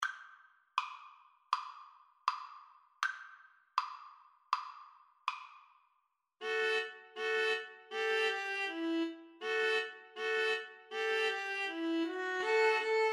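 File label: string trio karaoke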